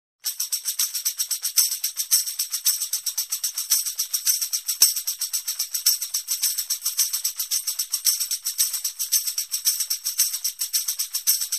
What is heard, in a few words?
Tambourine Groove accenting 3 beats